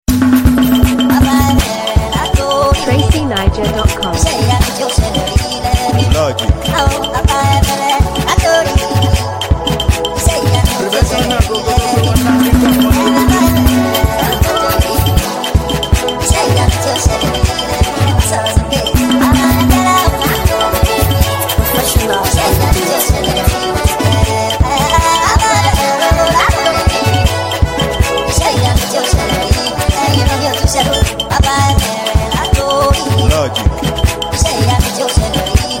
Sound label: Professional Beat, Speed Up